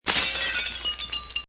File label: Glass Breaking